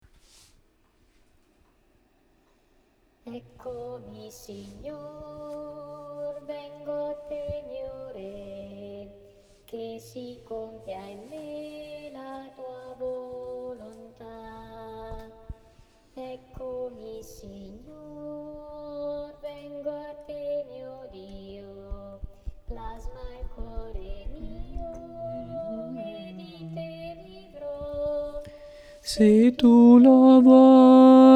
tenori

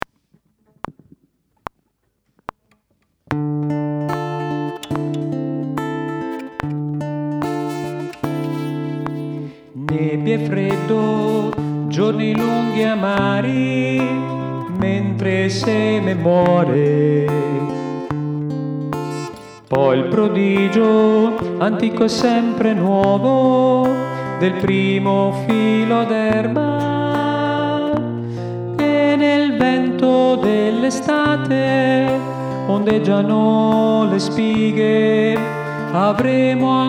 benedici, tenori

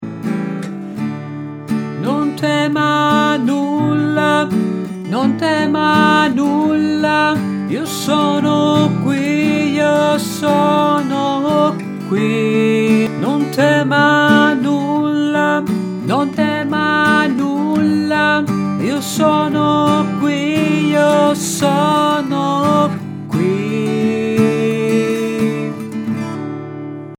uomini

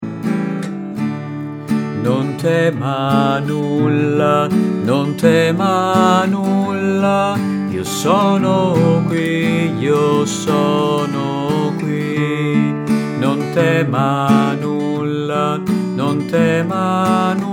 soprani

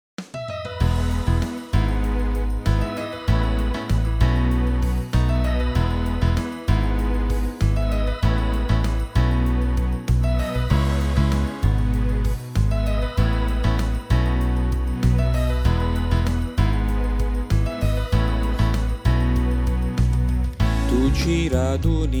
con gioia veniamo a te tenori

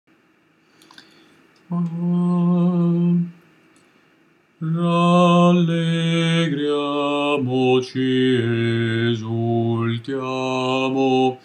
bassi

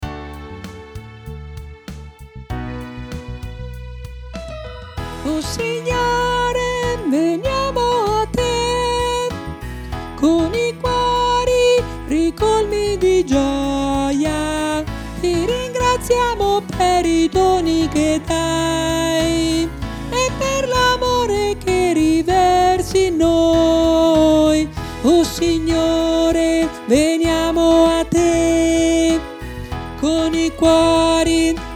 con gioia veniamo a te contralti

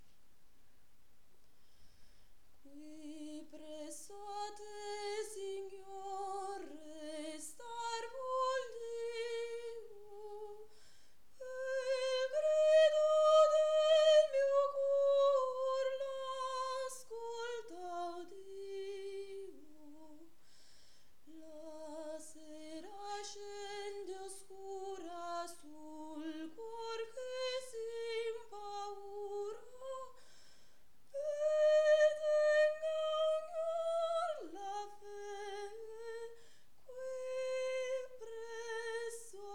soprani

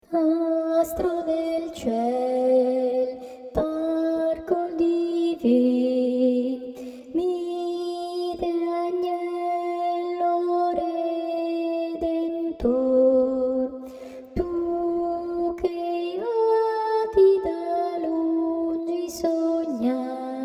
astro del ciel contralti

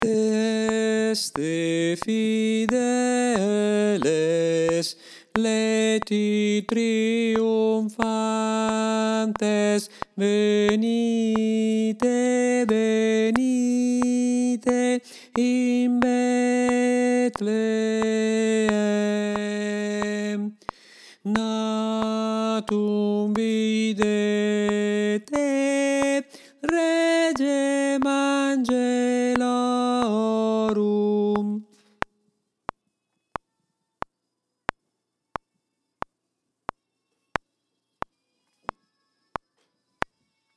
tenori

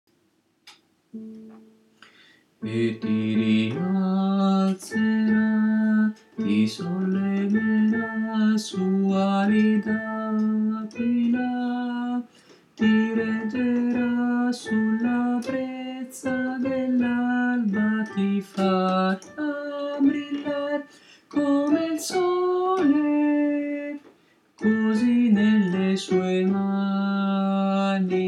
tenori

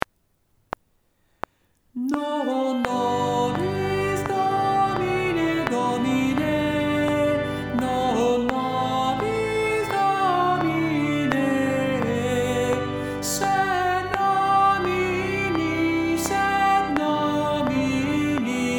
non nobis donne

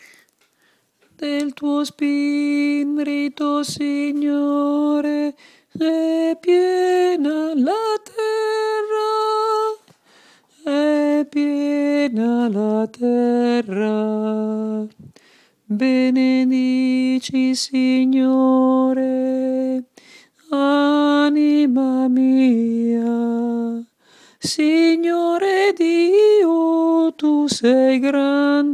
contralti

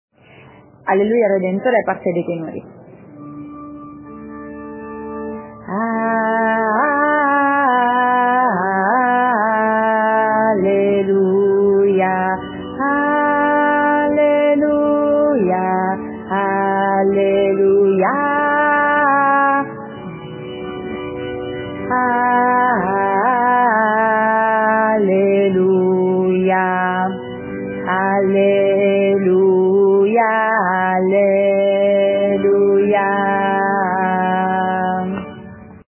tenori

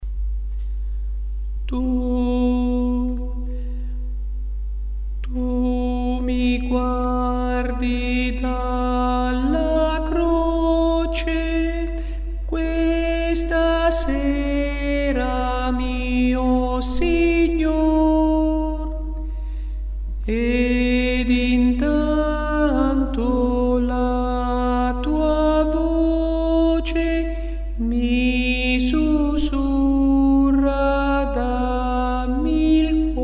contralti

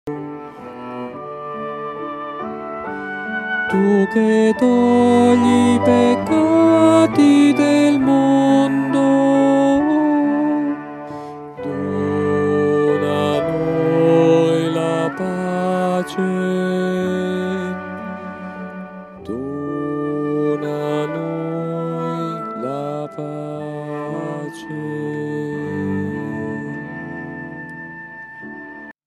agnello di dio, tenori; concordia

